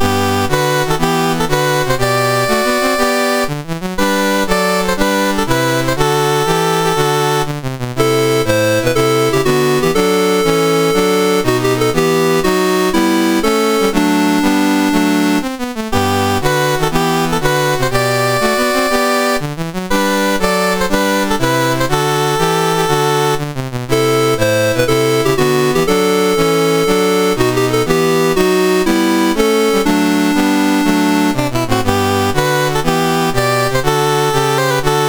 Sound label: MOS6581R4, National Anthems; Ivory Coast